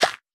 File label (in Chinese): 堆肥桶清空声